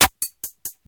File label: hand clap g key; percussion; single hits one shots